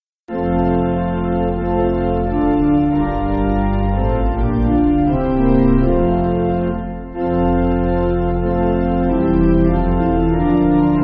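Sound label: Pipe Organ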